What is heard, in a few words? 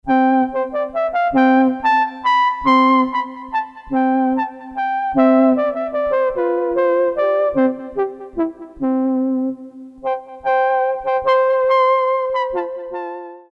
Wind